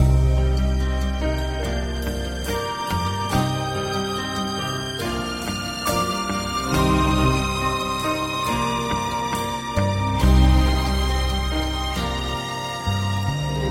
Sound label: strings, Sad